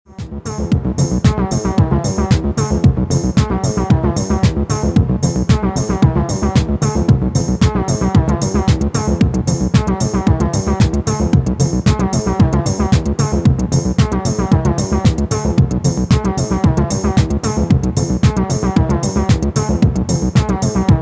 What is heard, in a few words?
rhythm